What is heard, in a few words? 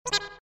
meep marp